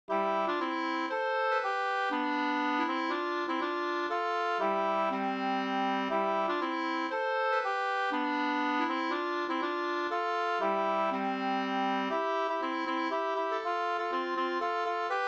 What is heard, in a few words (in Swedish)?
polska Cdur